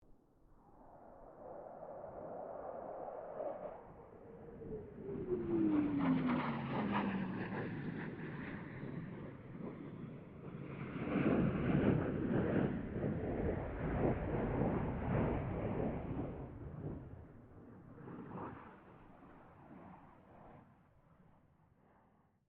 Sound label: AIRCRAFT FA-18 HORNET PASS DISTANT